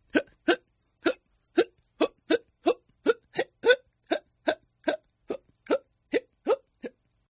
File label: Hiccup Attack; Hiccupping; Human